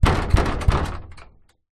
impacts hits thumps on loose metal railing pole holding glass